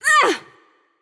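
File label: grunt hit, female; smite; voicepack; hera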